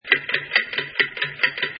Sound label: CLOCK